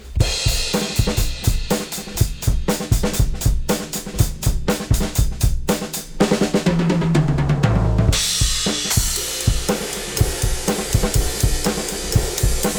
Compressor drums Rock Drums Parallel

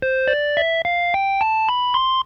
Nord Lead 4 width

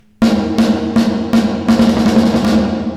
Snare Plate

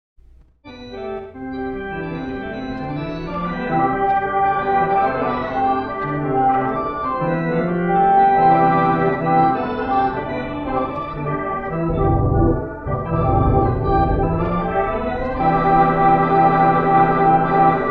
organ; Crystallized Honey

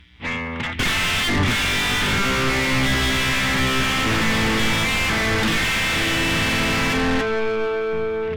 tube delay dry drive

